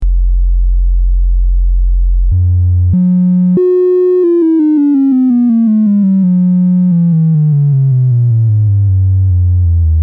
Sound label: vco modulation, analogsynth